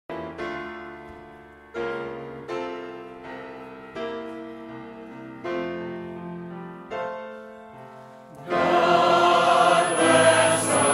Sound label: choir special, Baptist choir; starnes cove